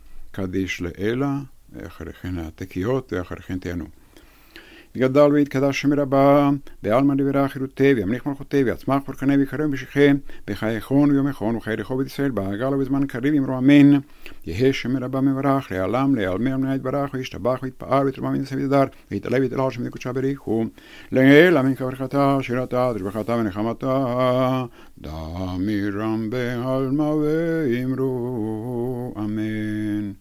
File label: Half kaddish, Sephardi music